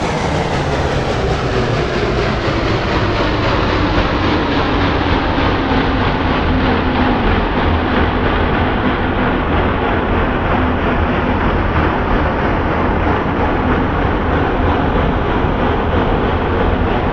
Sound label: Train Roll Slow Down